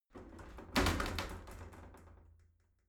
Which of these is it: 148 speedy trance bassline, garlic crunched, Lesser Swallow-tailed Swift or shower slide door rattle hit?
shower slide door rattle hit